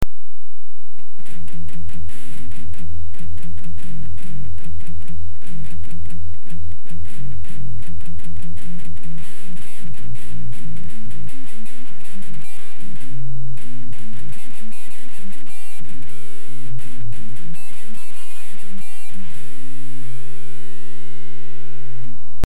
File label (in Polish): tlumienie nadgarstkiem